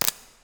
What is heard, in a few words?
substation oneshot; ambient; Counter Strike Model Pack; CS:GO; nuke